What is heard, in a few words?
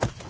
body fall; There; ThereClient